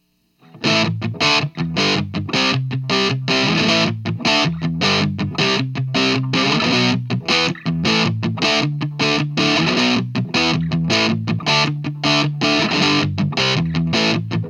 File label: LOW BOOST; st9pro+